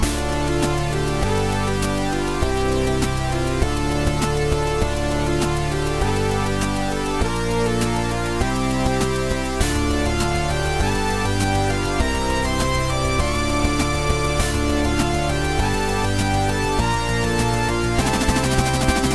music menu; numnastics

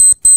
Announce; fenrir

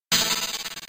bonus; game; last stand; cli-games

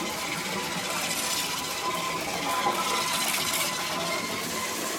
music car; game; mine-racer; cli-games

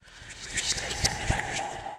new goal; draugnorak

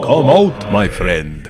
vampyr; enemies; draugnorak

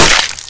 skull lands; wicked-quest

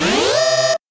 announcement; ttyverse-extensions

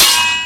player3, sword combat; game; bashit; cli-games

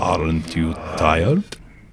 vampyr; enemies; draugnorak

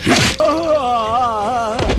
kick hit; monkeyspank

